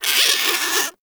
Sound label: Forest Rodent Vocal Angry Short; Warframe; Characters